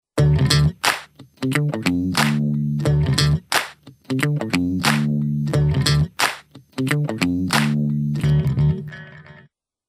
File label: Jingle; Albert Heijn